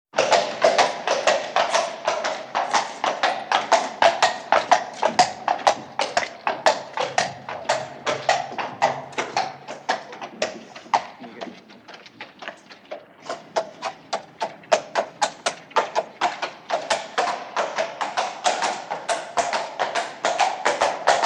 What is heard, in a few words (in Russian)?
Kopyta stuchat ccokot